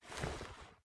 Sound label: sight lower